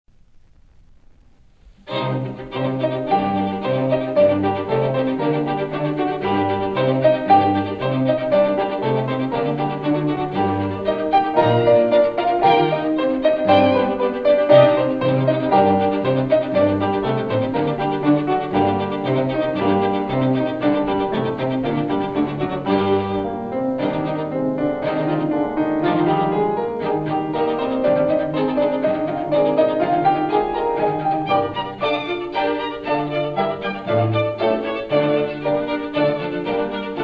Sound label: Piano Quintet in E flat Allegro ma non troppo; Schumann; Op. 44